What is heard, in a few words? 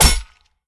stab hit; smite; lancelot